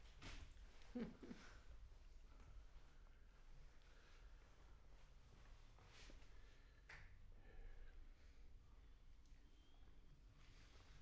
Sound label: Choir